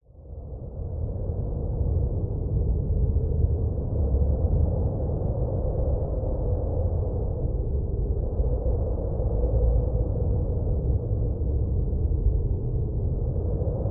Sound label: nature wind cold strong stormy blizzard snow icefield